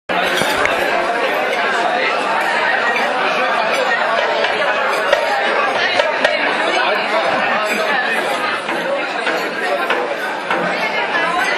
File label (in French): Ambiance TUNISIENNE reveillon, Orchestre